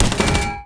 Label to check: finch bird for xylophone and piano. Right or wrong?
Wrong